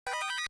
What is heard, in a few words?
Got Item; Final Fantasy; NES